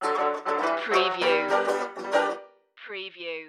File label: cleos jig stinger